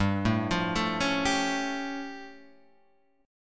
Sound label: A Augmented 7th G